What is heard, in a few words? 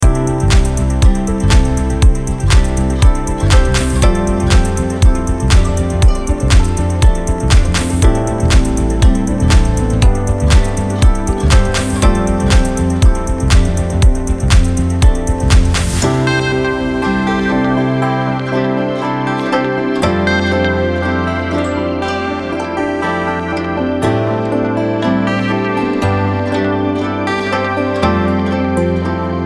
japanese corporate loopable